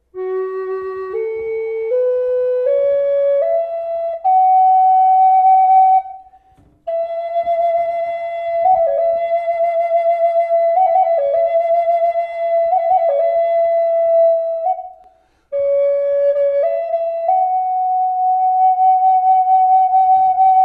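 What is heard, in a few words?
reverb, flute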